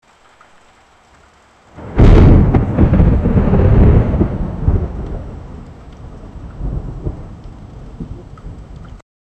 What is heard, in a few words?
sound effect loud thunder